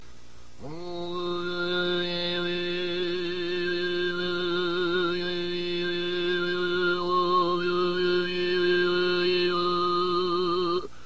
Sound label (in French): mongol, voix ethniques